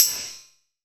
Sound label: Perc, Gated Reverb; musicradar